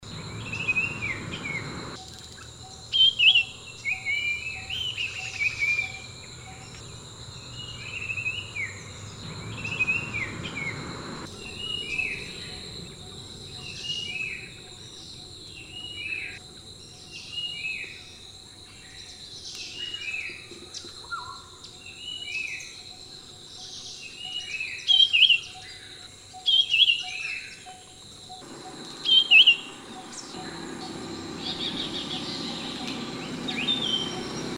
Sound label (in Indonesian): suara marshall iora